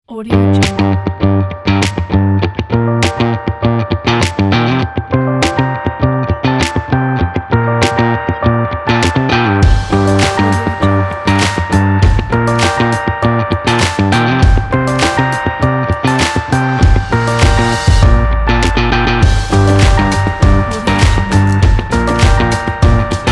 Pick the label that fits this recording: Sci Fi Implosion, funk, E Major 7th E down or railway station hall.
funk